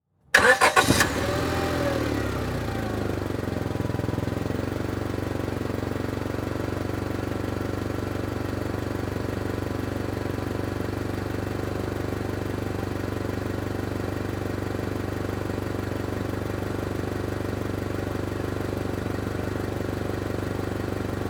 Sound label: Transportation Cars Honda Civic Start Idle Off Mic Under Tire